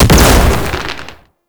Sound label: Quick Strike Projectile Explosion; Enemies; Abilities; Warframe; Tau; Roathe